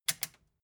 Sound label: metal button click power on fast